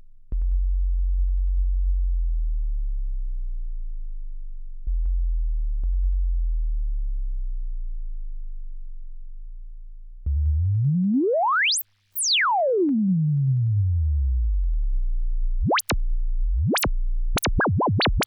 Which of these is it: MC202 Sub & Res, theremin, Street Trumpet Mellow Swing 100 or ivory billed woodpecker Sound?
MC202 Sub & Res